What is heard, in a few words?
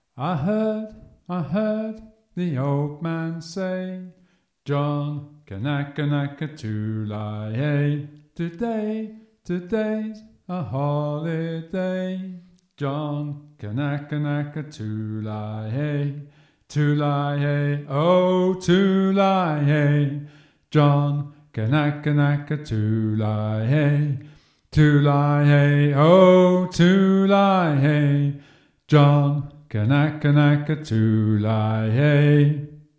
bass; John Kanaka